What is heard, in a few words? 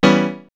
House Piano Chord; X-Static Goldmine; backtothe70's